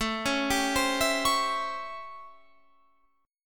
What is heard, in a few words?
A 7th Sharp 9th, Guitar, Open F; A7#9; arpeggiated